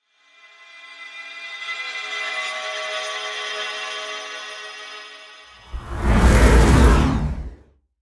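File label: Mini-game passed; house of 1000 doors serpent flame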